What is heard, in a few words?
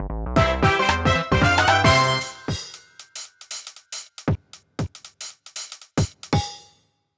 Jingle Course Clear Another Goal; mario wonder